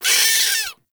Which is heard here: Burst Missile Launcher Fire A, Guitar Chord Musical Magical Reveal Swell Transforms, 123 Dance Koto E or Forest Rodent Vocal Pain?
Forest Rodent Vocal Pain